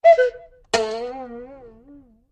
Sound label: Cuckoo Bird Followed By Sustained, String Pluck Boing; Cuckoo Clock Breaks